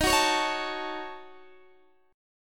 D sharp Minor 7th Flat 5th D sharp down Guitar Open D